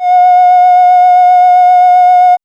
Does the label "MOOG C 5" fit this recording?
Yes